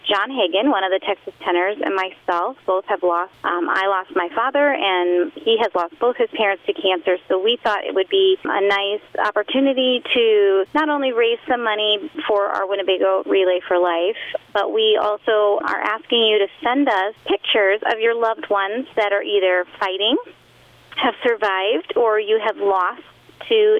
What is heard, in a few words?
Tenors